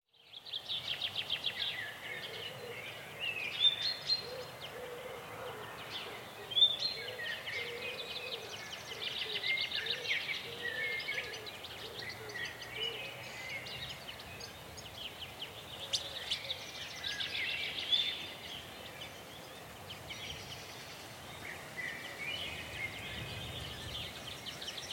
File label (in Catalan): Biofonia horabaixa Cases Menut